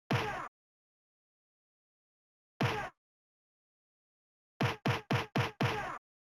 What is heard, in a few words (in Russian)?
effekt udara komichnij udar kulakom